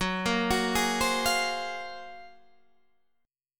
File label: F sharp Major Sharp 11th, Guitar, Standard; 14,13,16,13,13,13; arpeggiated